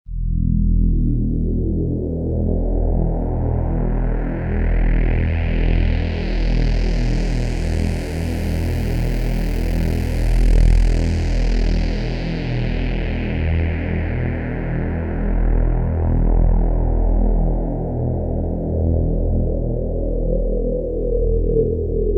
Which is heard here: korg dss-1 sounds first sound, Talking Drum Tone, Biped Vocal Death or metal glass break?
korg dss-1 sounds first sound